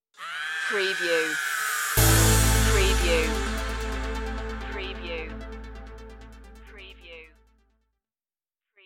disco stinger

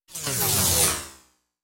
Scifi Production Element - Phased Descent

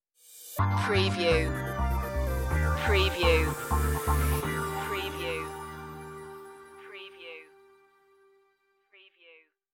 beach side stinger

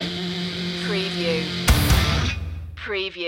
monster machine stinger

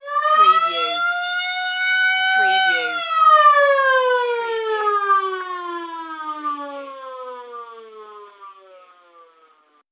Police Siren Wail and Fade

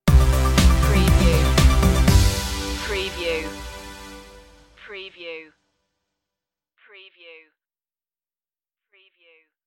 hokkaido stinger

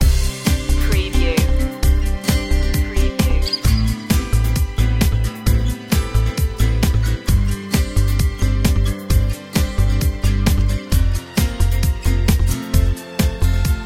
ambition score loop

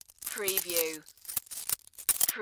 Coins Moving in Hand

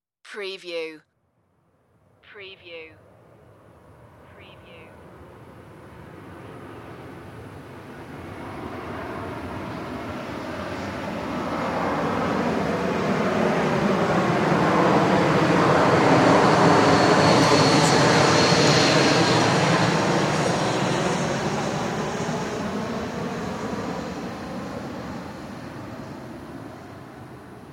airplane flyover

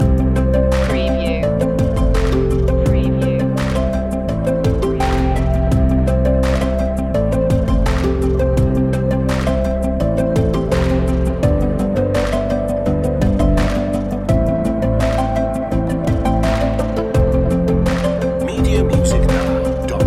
flicker loop

